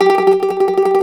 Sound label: MANDOLIN, Tremol Mandolin; StTremolMandolin